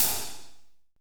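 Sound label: Kit Heavy hi-hat closed; CMI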